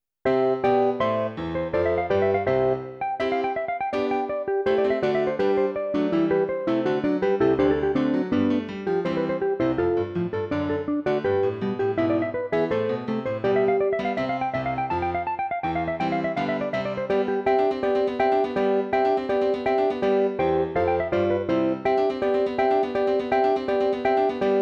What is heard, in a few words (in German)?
violine allegro